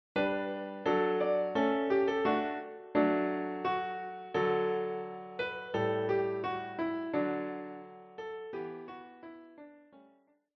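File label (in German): ABC die Katze lief im Schnee Klavier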